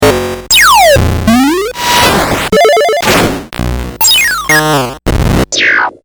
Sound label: Arcade SFX